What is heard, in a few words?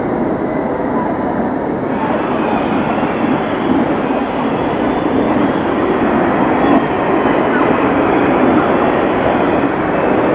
birds